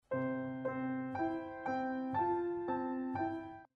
piano slow

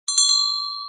bell icecream

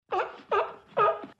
seal Sound Effects short

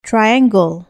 triangle

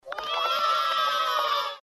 kids Cheering

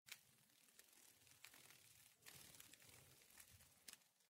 butterfly wings sound effect

